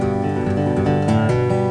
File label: guit g; guitars